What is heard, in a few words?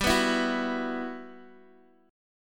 B Suspended 4th G, chord; strummed; Bsus4; JGuitar